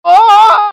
uuhhh

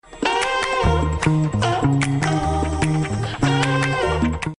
jingle; france 2

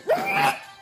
noise

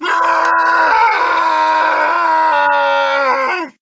zombie soldier scream

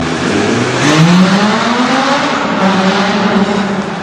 lamborghini gallardo

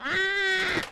uaaaaggh

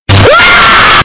screaming loud af

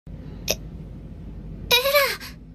error; plastic memories

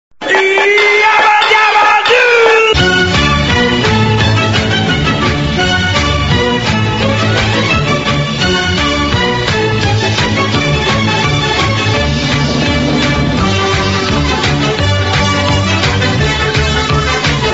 bayern new goal song loong

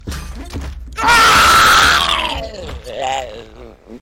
death scream

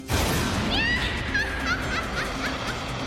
nya hahahaha

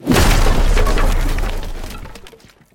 axe door break mono

